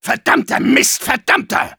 Engineer negativevocalization, de

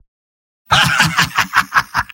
laugh evil; Spy; mvm

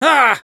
Spy negative vocalization

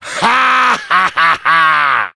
Demoman laugh evil; Rire méchant 3

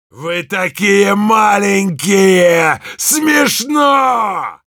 yell, ru; Heavy

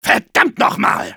Engineer negativevocalization, de

